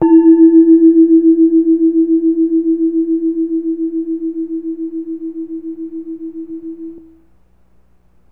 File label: calung, gamelan; gravityharps